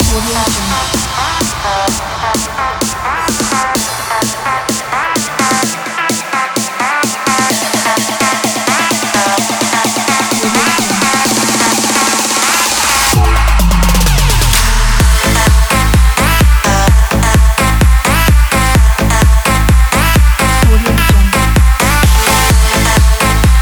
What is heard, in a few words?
edm on